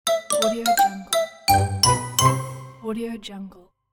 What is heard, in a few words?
playful cute musical its over; applehillstudios